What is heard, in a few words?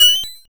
Pickup Coin